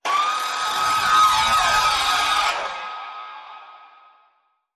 Alien Scream

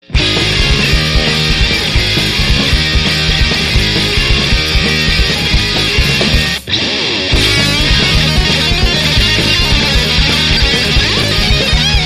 w Lead Guitar

